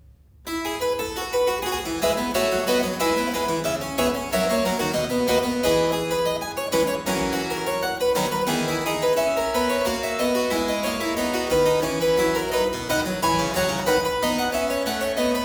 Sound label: Praeambulum in F major, Keyboard Works; J.S. Bach; Johann Sebastian Bach; Inventionen & Sinfonias